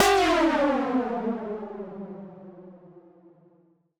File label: Siren Horn down, Ramp Down, E; future rave